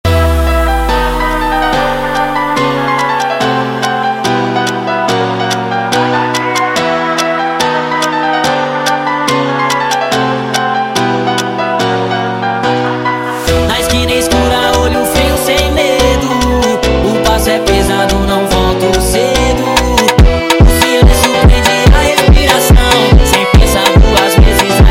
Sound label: Batida sped Up